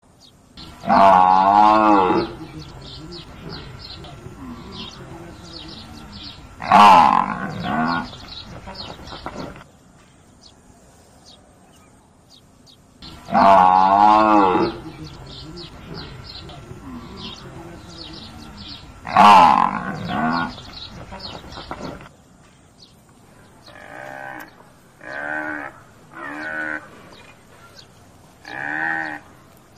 Tieng nghe keu

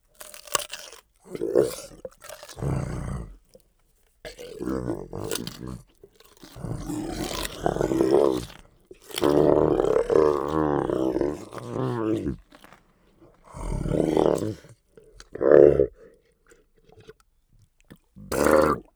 Tieng quai vat an thit

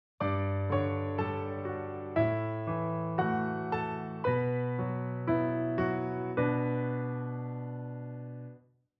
Nhac nen mo man gioi thieu san pham piano nhe nhang

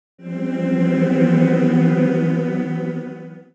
Am thanh khi than chet xuat hien

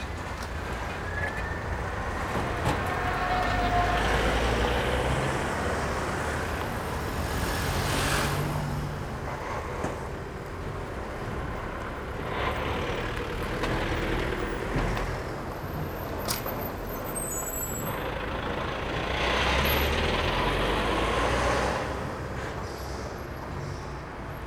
Tieng xe tai chay qua lai o duong pho

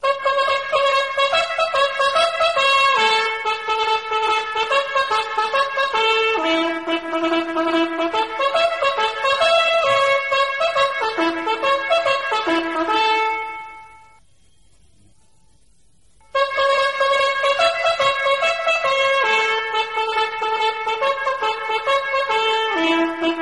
Nhac chuong bao thuc cuc to

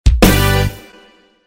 tieng trong joke

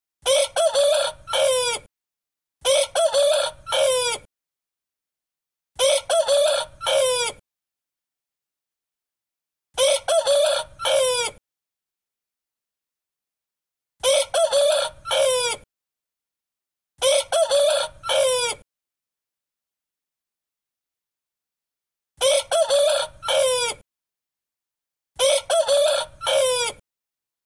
ga noi gay

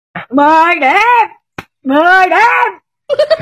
Am thanh 10 diem

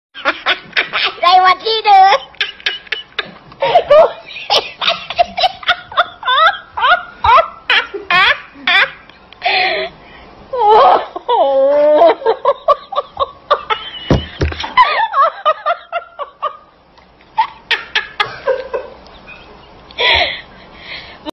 tiếng cười

